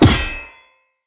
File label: Blunt Heavy Impact Metal; Weapon Impacts; Player; Environment